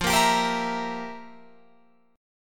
F Major 7th Suspended 2nd Suspended 4th, F, down, Guitar, Open F; strummed